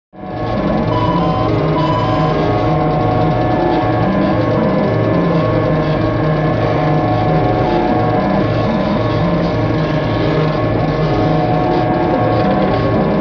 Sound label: Computer Room Comput